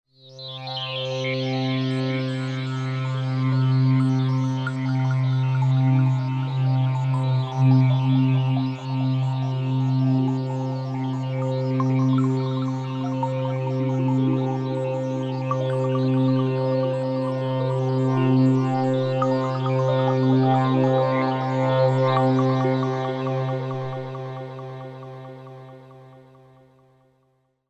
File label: Hydrasynth; venus